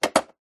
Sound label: coffee machine plastic pod holder lever close; nespresso